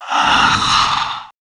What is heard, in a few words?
female zombie idle; nmrih; zerosiki; slender